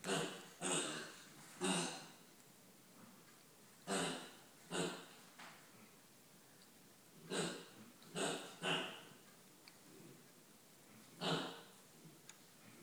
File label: continuous oinking